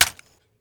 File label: m16a2 mag tap